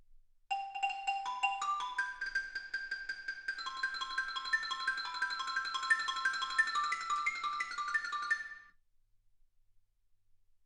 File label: xylophone